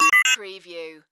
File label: Chime Readout Down